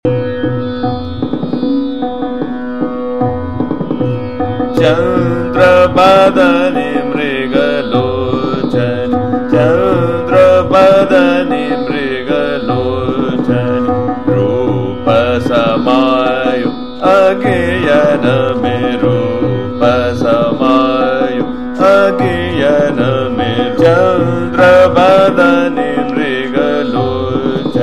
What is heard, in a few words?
Chota Khyal, Ektal Drut; Chandra Badni Mrig Lochnt; Todi